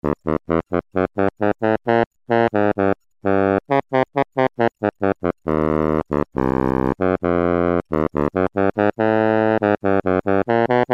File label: Bassoon, Roland Juno-106; Factory Preset